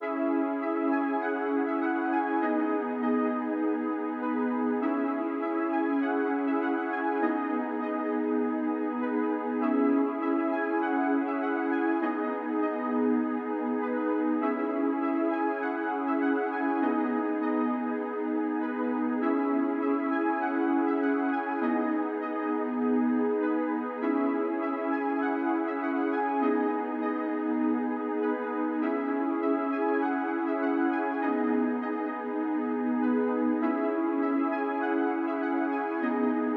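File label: Afro Melody Loop C minor 100 BPM Pad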